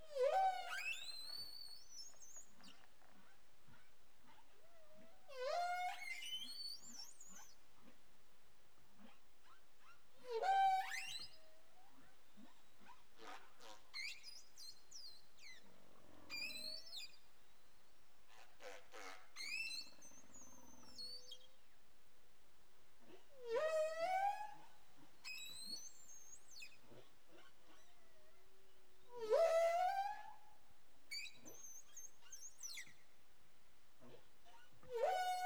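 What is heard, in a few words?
humpback whale song; sanctsound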